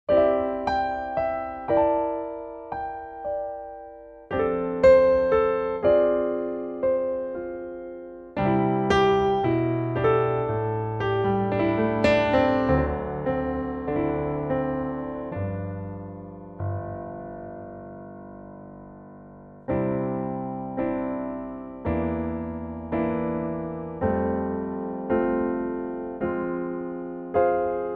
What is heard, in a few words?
C piano; Pianotrak; key - C - vocal range - A to C (optional D); intimate 2024 Pianotrak